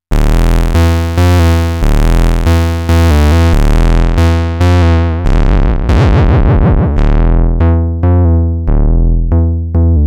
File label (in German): DSM01 24dB halbe Resonanz